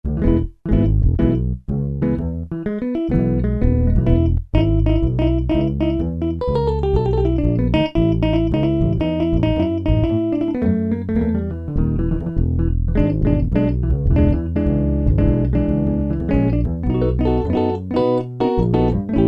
Delay 115 msec

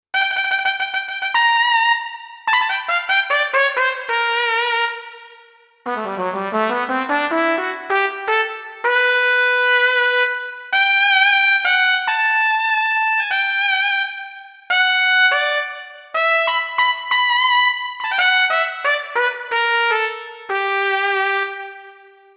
Spr Trumpet, Roland JV-880; Factory Patches

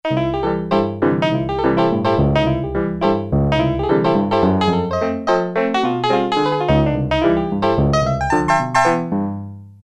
Honkey Tonk, Yamaha TX81Z

